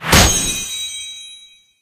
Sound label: Sticker Slam; Angry Birds